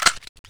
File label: l96a1 clip out; weapons; swb